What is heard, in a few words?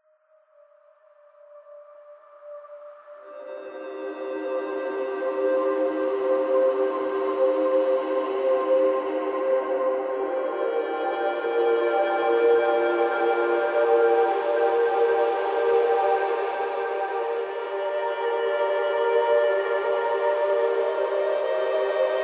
Mus Grasslands Stem; Epitasis